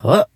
little fighter guy vocalizations jump lift push; fvtt sonniss gdc audio

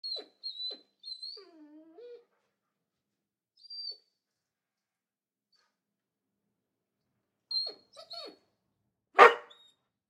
dog border collie mix whining and bark; fienup; sonniss gdc

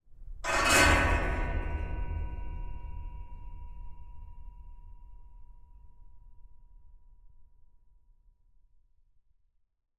sculpture edges; Sonniss GDC

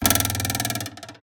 cartoon arrow; sonniss gdc

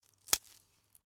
footsteps twig; weather and nature

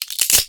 bone cracking; sonniss gdc

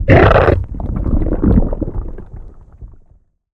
organic texture giant animal; bluezone; sonniss gdc

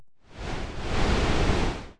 ranged magic energy strands; 60ft; psfx

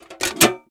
cartridge case handling; bluezone; sonniss gdc

